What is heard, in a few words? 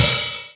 OPEN HH; USB Soundscan vol.51; AKAI